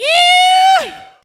cowboy yeeee

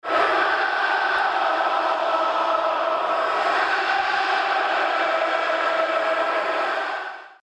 chant; sounders

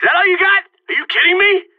follower sound stream

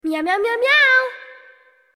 meow; nana rework

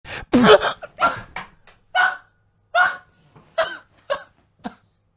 rage crying

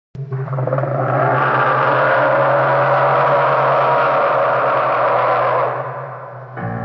bunyip roar; dot and the kangaroo

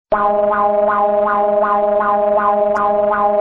mexico eas alarm

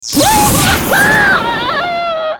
pig scream; bobafatt

